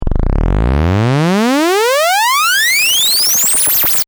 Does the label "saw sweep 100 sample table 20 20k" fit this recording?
Yes